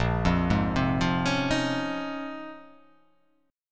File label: Bb Minor 11th, Bbm11 chord; arpeggiated; JGuitar